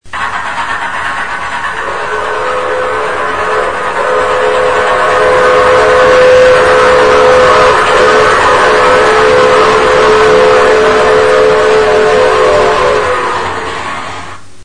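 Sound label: approaching train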